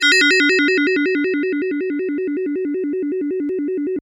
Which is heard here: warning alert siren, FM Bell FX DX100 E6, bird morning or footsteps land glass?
FM Bell FX DX100 E6